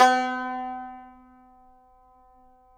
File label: tar; Roland - LA Composer vol. 3